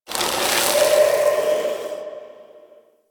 Infested Pistol; Warframe

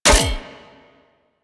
Full Draw Mech, Weapons; Warframe; Infested; Derelict Cernos Prime

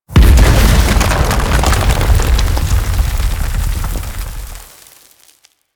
Thumper Bot Spawn Emerge Dirt C; Warframe; Grineer

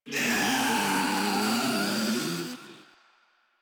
Infested Ancient Death; Warframe; Proto Infested

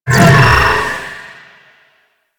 Well Of Life Loop End; Warframe; Warframes; Trinity

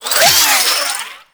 Necramech Repair Drone Claw Spin; Enemies; Warframe

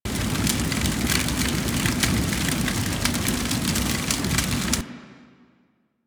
Hub Fire Loop; Warframe; Tenno Hub

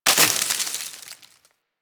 Landscape Plant Destroyed; Warframe; Ambience; Venus Open World

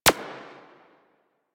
Decoy Counter Measure Shot

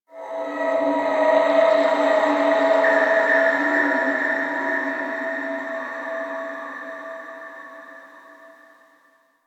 Factory Texture, Ambience; Warframe; The New War; Zariman

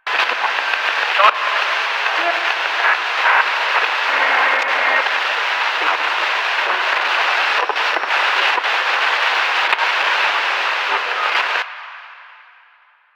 TV Glitch Loop; Warframe; Ambience; Nineteen Ninety Nine

